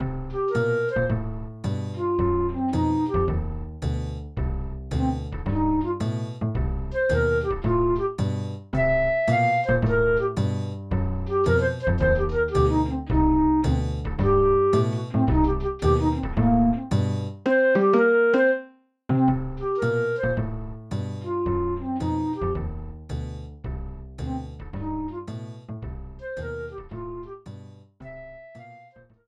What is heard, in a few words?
Roland SC-88; SimCity 2000